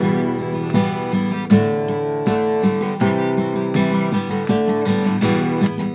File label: EMGTR; USB Soundscan vol.42; AKAI